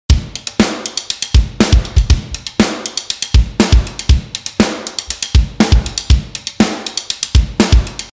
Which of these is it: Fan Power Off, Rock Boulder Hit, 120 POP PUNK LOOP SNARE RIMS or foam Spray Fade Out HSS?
120 POP PUNK LOOP SNARE RIMS